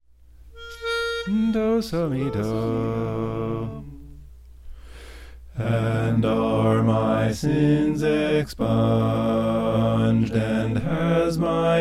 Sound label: bass